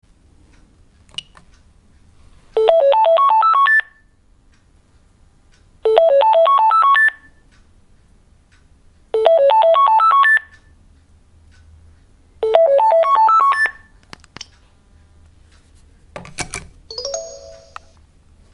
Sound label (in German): modernes telefon